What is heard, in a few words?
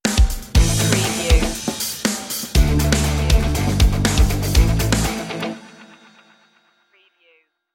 big machines stinger